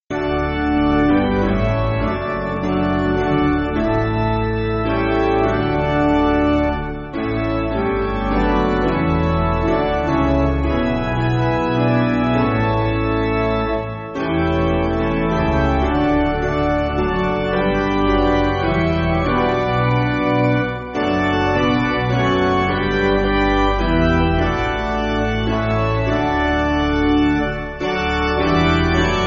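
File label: Piano, church backing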